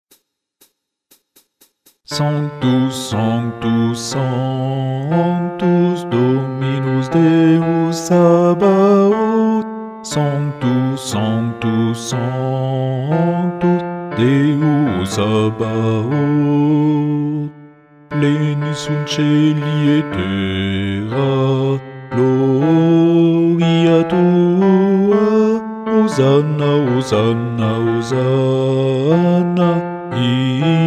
Guide Chant Basse; Messe de Saint Jean Sanctus

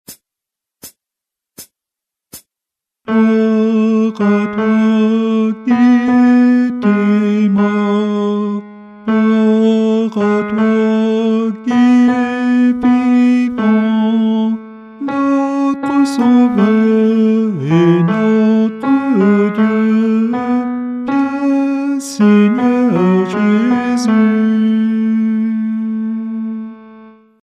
Guide chant Ténor; Messe de St Paul Anamnèse

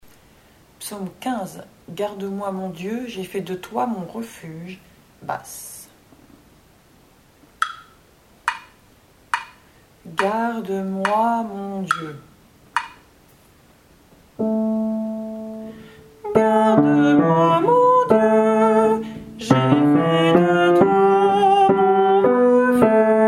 psaume 15 Garde moi mon Dieu BASSE

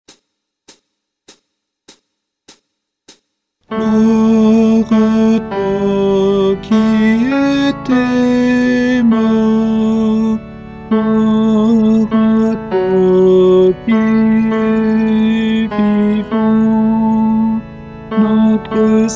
Messe de St Boniface Anamnese Guide Chant Tenor

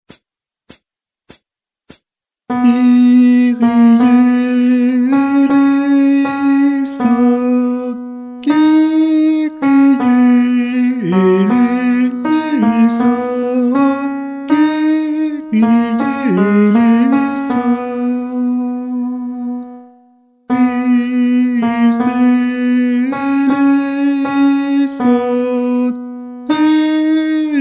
Guide chant Ténor; Messe de St Paul Kyrie

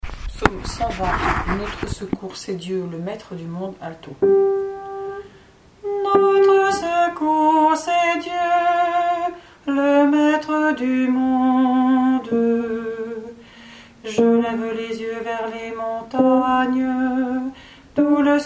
ALTO; Psaume 120 Notre secours cest Dieu le maitre du monde

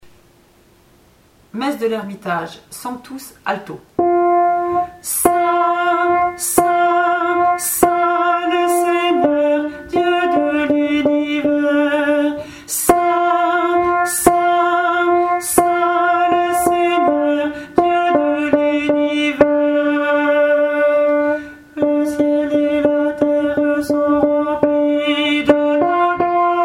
ALTO

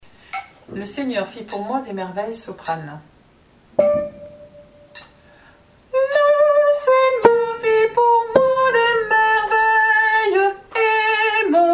Le Seigneur fit pour moi des merveilles SOPRANE

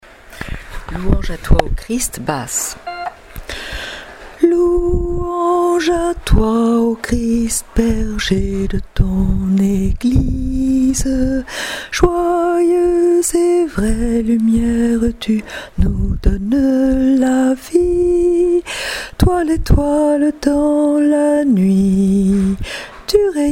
LOUANGE À TOI Ô CHRIST BASSE

